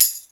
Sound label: TAM; E-MU Formula 4000 Series Vol. 1; Hip Hop Nation; Bonus Perk